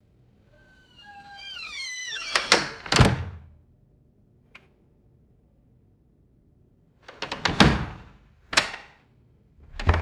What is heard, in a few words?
wooden door closing with metallic whine; doors